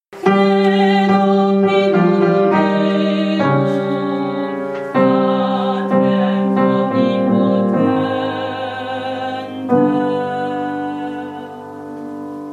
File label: credo alto